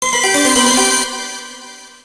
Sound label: Coin; Xexex